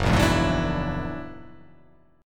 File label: F sharp Augmented 9th; 2,1,2,1,4,1,4,1